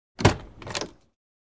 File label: SUV car door opening